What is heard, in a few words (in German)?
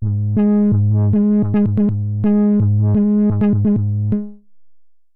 Basslauf with legato